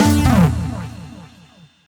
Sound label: Band Stop; The Adventure Pals